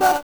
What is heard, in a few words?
Voice Choir; Genesis